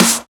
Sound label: Snare; FLPRO